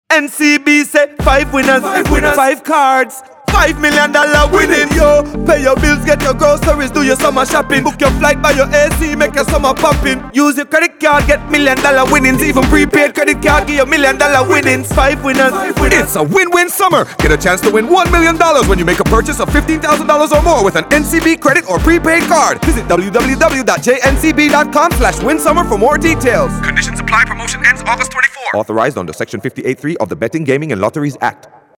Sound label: summer jingle; NCB; NCB Win Win Summer Cards Campaign